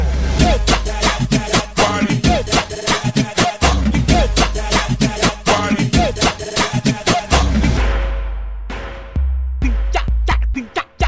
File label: funk brasil ringtone